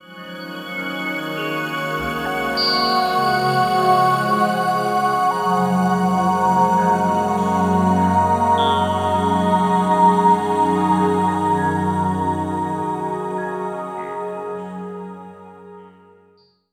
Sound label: Hi Complex, Complex Wave; Sonic Foundry; Sony Creative Software; James Johnson Spektral Minimalism